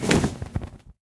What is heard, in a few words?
wings, enderdragon; mob; Minecraft